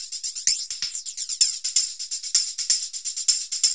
TAMB; Zero-G; Chemical Beats

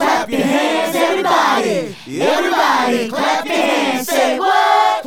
Female Group, Hip-Hop and RnB Vocals

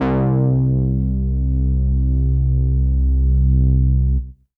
Synth Prophet Bass C; Electronisounds

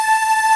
Ending loop

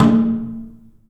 DRUM; The Piano; InVision Interactive

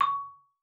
marimba C#6; Xsample VOL-4

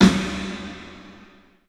PA DEEP R, CLUB SN VRB; 3D Sound; 3D Drums

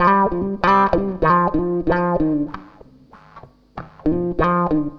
WAH, wah wah gtr

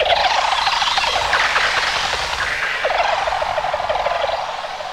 LAUGH PIDGI; ENVIRN-AMB

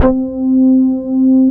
Korg POLY800 C2; Back In Time Records; Korg Universe VOL-1

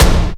hit, percussion hit; Ueberschall; Techno Trance Essentials; DRUMS

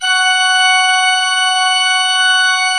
F4, Cathedral Organ, MANUAL PLENO; Propeller Island

